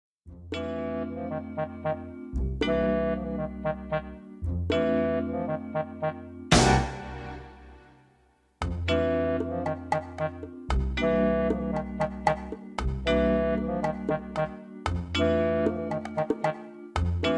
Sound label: coffee break, Live Orchestra Musical Theater Backing Tracks; How To Succeed In Business Without Really Trying